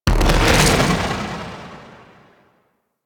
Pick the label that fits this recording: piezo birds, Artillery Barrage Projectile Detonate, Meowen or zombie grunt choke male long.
Artillery Barrage Projectile Detonate